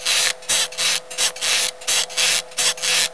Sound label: OLD ROCK; SCARY